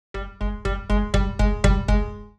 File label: Cartoon angry walk